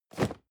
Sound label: cardboard box medium place; objekte